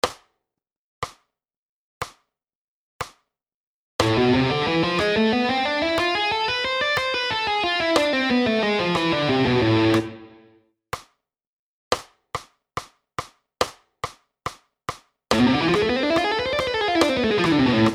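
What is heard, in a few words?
Harmonic Minor Scale, guitar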